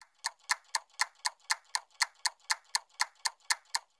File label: mecha; fallguys